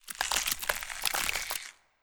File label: Circular Saw Gore